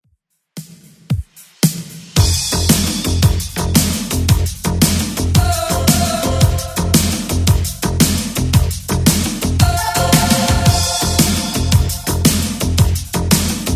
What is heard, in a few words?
80s 113bpm; Redrum